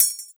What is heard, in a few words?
PERC R, Drum & Bass 160 BPM; Ueberschall; Drum & Bass Flash Trackz